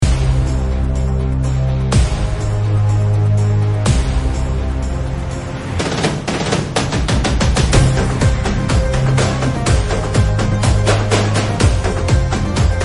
Karaoke Ringtone

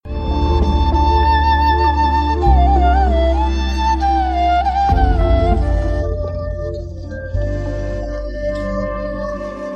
Night Drive Love Malayalam BGM

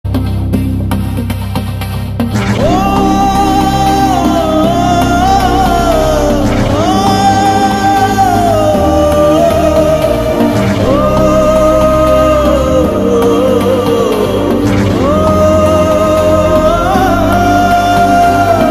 Sad Bgm

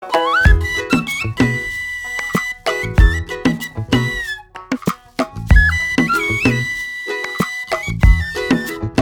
Flute Tone; Njandukalude Nattil Oridavela

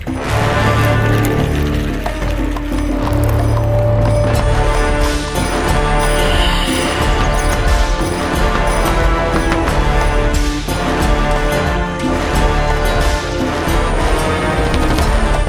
Flashback BGM; Kala

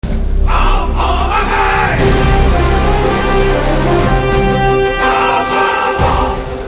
Tamil BG Theme Ringtone; NOTA Trailer

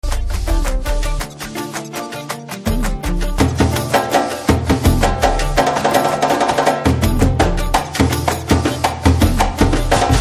Hindi Slow Motion BGM Ringtone; BHARAT

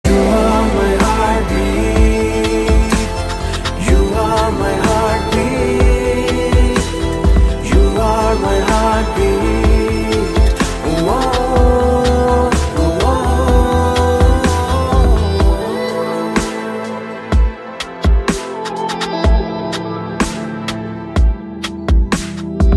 Bgm Ringtone

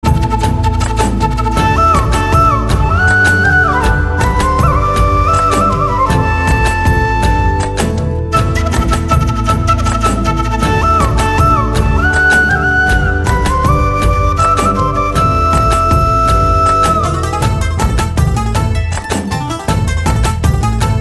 Song Love Bgm

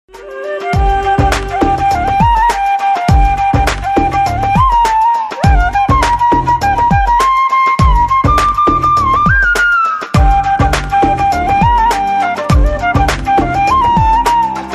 Flute Tone

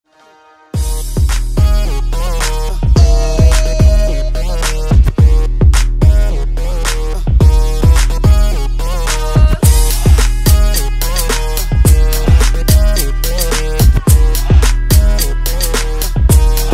Hookah Hookah Music Ringtone

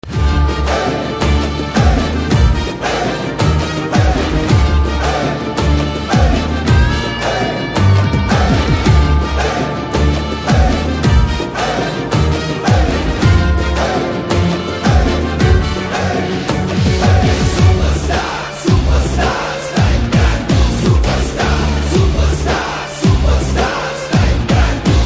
Anthem BGM Ringtone

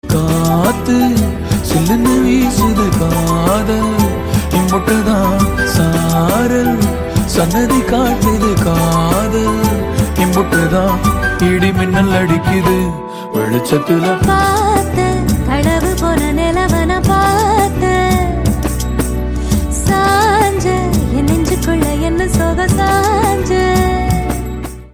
Male Version Ringtone

